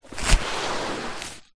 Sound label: bag teachers fabric containing books and paperwork drag on ground; foley